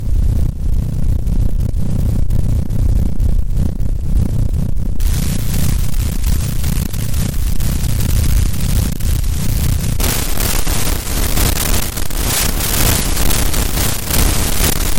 line noisy; Example scripts for the SAU language, and audio files rendered from them using the saugns

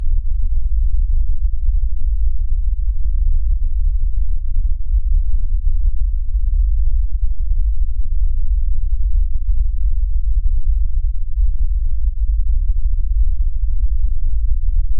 ambient rumble; Example scripts for the SAU language, and audio files rendered from them using the saugns

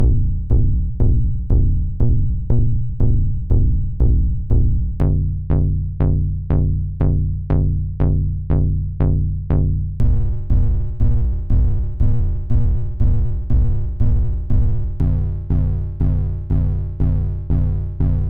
bass sounds; Example scripts for the SAU language, and audio files rendered from them using the saugns

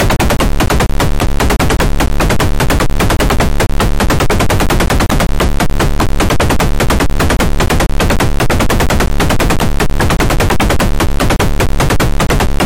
drum noisy

